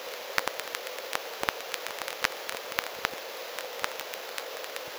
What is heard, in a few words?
RECORD CRACKLE; pitchshifter PSI